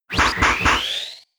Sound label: Spin; Rapid Spin